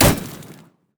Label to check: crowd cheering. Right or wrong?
Wrong